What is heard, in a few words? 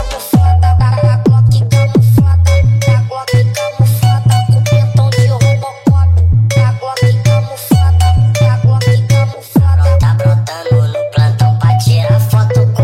funk bass